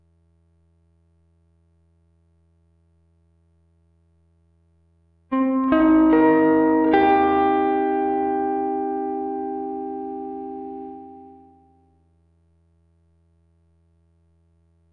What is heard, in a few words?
gtr texture